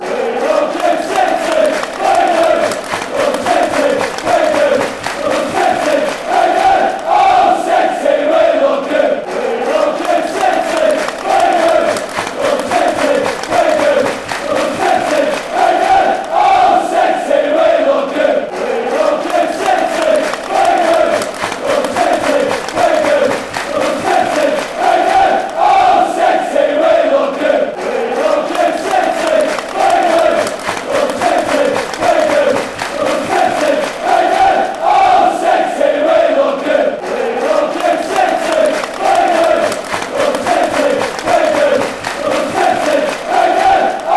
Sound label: we love you city, fanchants